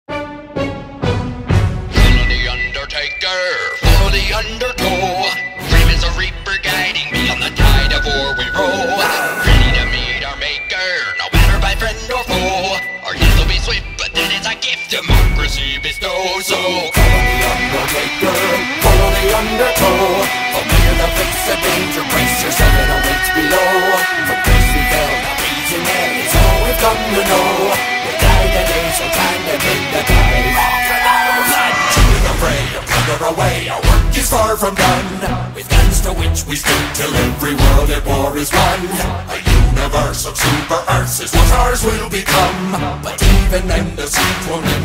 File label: space shanty; Helldivers 2